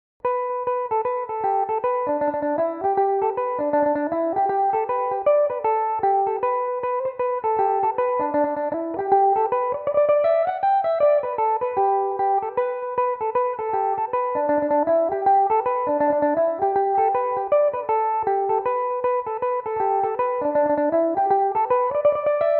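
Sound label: G major, Irish mandolin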